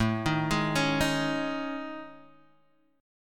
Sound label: A Major 7th Flat 5th, Guitar, Open G; chord